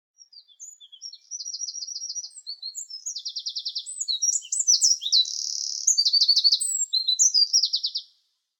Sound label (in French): troglodyte mignon chant